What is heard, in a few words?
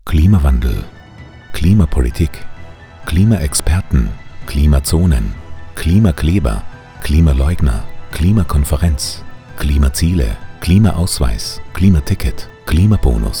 Musik; Klima Demo Von Pol zu Pol